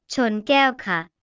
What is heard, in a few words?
cheers